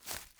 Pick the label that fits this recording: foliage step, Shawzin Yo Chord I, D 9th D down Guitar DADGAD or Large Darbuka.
foliage step